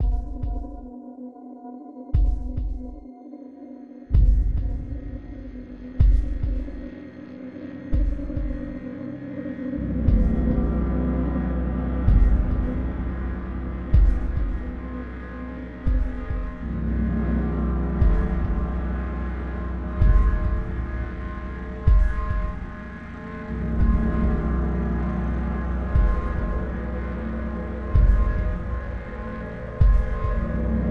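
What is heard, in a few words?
music, pulse scary ambient loop; transformers war for cybertron gamerip; IACON RUINS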